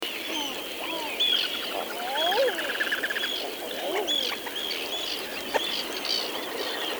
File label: rtstone interpres; sudurbar